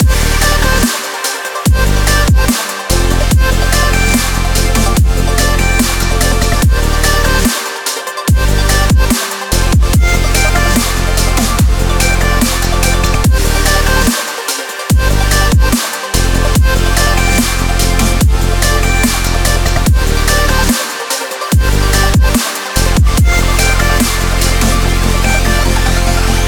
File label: extreme energy burst